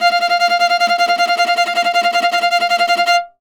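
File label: viola tremolo F3; Orchestral; DirectWave; Varazdin Orchestral; Viola II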